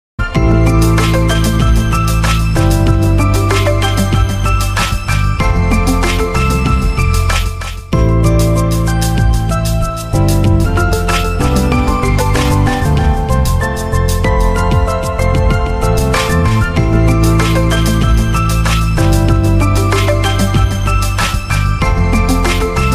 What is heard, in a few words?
Oppo F7 Original, ringtone